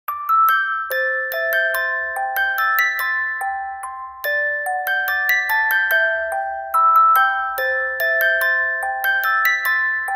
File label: Music Box